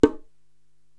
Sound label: Bongo Lo Open, Korg TR-Rack